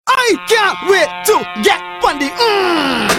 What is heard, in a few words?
Hmmmmmm